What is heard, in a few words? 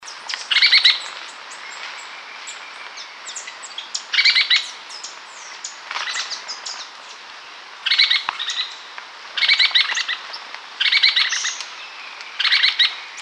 yellow fronted woodpecker